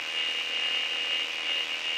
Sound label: static; Dystopia; silo